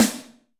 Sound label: Snr Crisp RM, Alesis D4